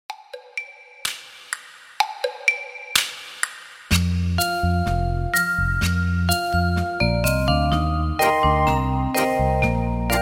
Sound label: Instrumental